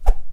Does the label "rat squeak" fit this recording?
No